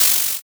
cloth rip

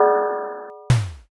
iron door

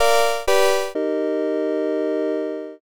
ending

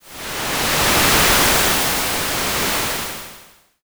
wave

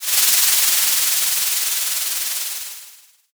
frying

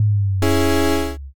boss appear

